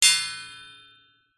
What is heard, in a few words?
impacts metal pole or thin bar hit resonate chime